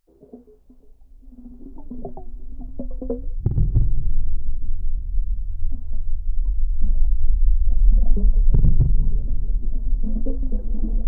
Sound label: sonification